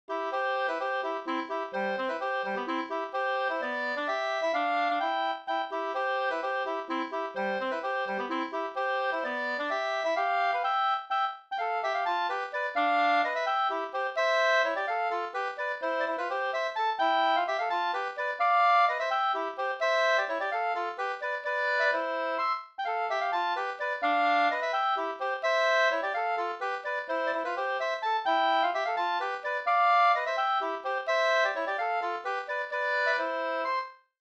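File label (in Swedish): polska, tvåstämmig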